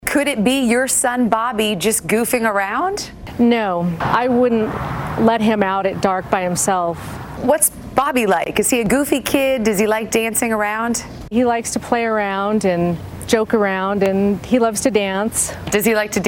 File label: creepy